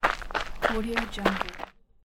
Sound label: walking on gravel path